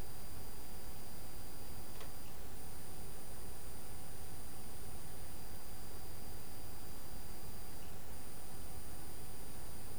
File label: aircon